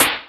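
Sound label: FX SNARE; E-MU Formula 4000 Series Vol. 5; Protozoa; Orbit Presets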